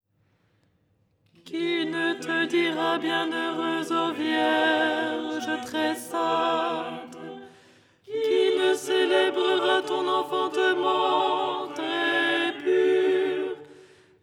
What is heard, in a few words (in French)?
alto; Théotokion dogmatique; Les 8 tons en français; ton 6